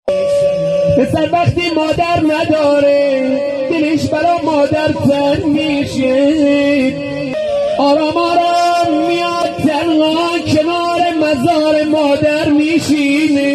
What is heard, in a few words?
music dashti soznak madar